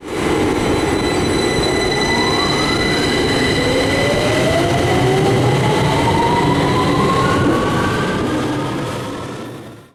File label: 2009 stock; panos g; simutrans; Pak128.Britain-Ex